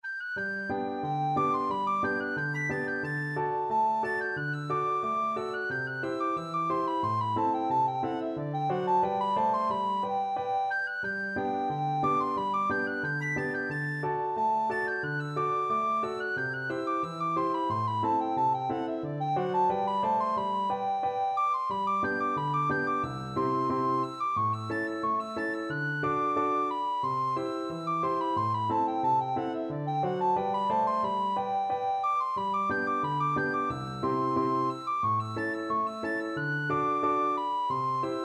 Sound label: hornpipe, recorder